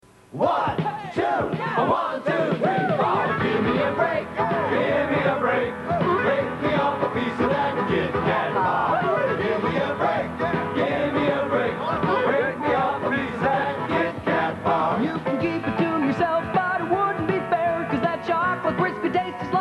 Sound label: JINGLE; KitKat; Speaking Human podcast; brand jingles